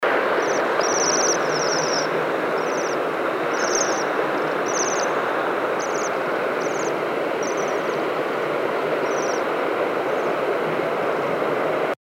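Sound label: bohemian waxwing, flight call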